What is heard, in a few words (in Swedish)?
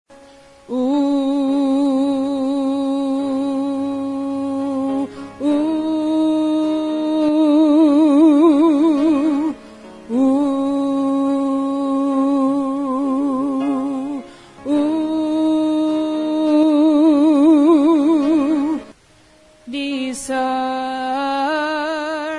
i just want to say alt